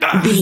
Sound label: voice male hurt pain